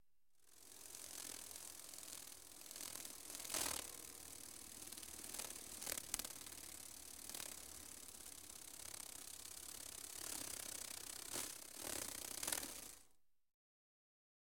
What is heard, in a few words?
Hummingbird Wing Flutters; Hummingbird Fast Wing Flutter. - Fast Wing Flaps